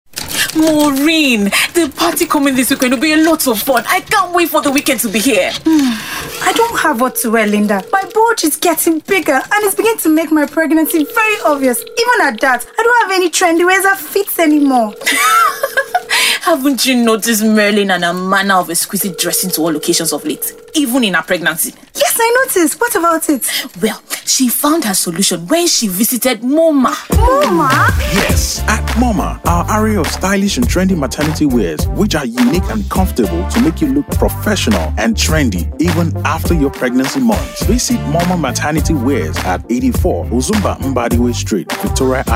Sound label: JINGLE; MOMA; christmas